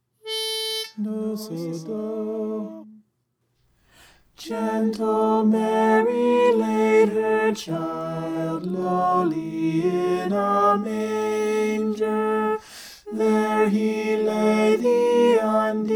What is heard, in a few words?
soprano